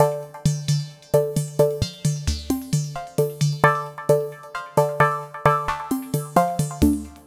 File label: Lead Synth